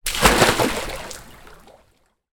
nature water splash child jump into pool straight jump; zapsplat